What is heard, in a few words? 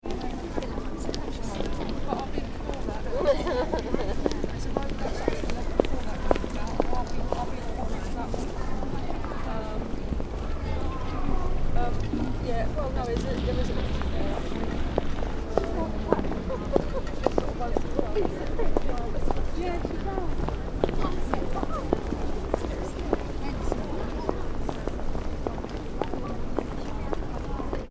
ambience urban town pedestrians walk talk; Town Pedestrian Street Ambience - Pedestrians Walking And Talking; McKinney Sound